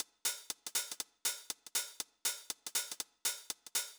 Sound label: House Drum OnlyHH Reverb